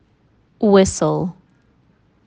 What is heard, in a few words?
whistle; سوت بزن